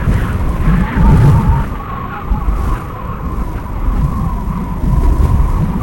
wind high; Quake 4 Alpha

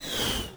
grapple lower; Quake 4 Alpha; id software

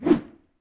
Imp Attack Whoosh, imp fireball

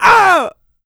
pain large, player; huge

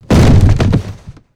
fall; Quake 4 Alpha; id software; strogg; tik

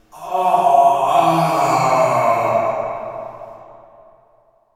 prison pain; Quake 4 Alpha; id software

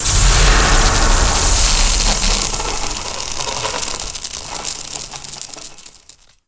cryo freeze; Quake 4 Alpha

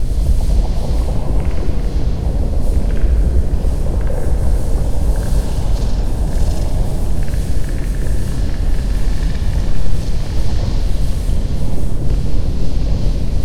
cryo big creaky; Quake 4 Alpha; id software

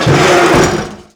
fence break; Quake 4 Alpha; id software; strogg; tik

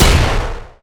blaster; Quake 4 Alpha; id software; strogg; tik

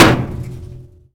metal barrel med; Doom

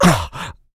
pain light, player; Doom

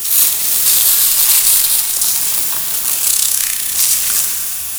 ice steam, ambience; Quake 4 Alpha

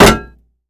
Bullet hit metal; Doom

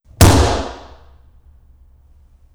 table foley

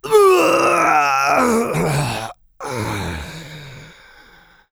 death, player; Doom

